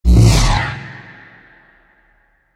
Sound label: big zap; Dystopia; injection